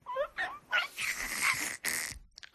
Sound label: vox 12 month baby laugh gurgle spittle